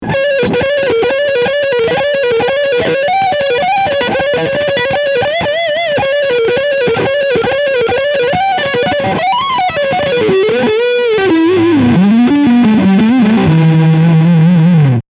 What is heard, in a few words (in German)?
Leadsound Front HB